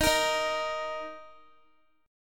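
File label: E flat 5th E flat, down, Guitar; strummed; 4E,4A,5D,5G,5C,6F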